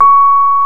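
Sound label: piano Rhodes Mk.V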